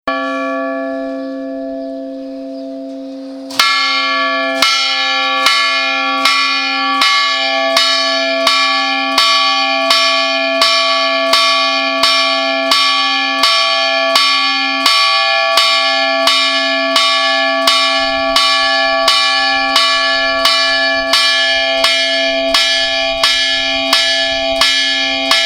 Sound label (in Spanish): Campanas de la iglesia dando la 3ª señal